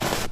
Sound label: Snow Crunch